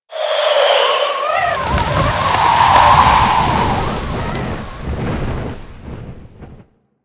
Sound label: Settlement Wind Debris One Off; Ambience; Warframe; Grineer Settlement